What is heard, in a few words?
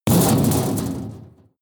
metal impact sheet bright rattle hard; hits explosionen; metall